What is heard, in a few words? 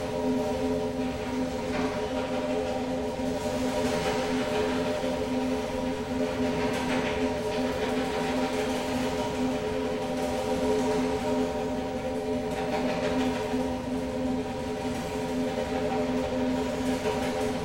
Cloth Flow In Pipe Loop; Journey